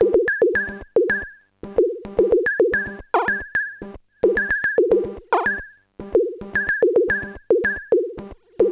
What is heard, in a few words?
psr 37 beat; Beat Orginal